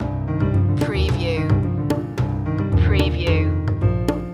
rhythm dance loop

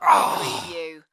Male Grunt, Human Injury, Pain, Vocal Ugh, Ouch

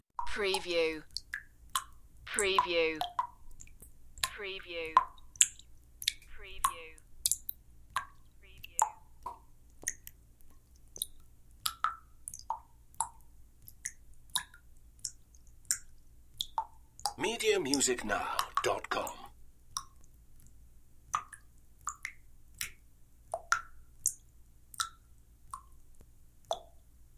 Drips; Household Sounds / Bathroom Sounds

